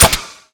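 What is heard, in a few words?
trigger off; svencoop; ins2; ftm2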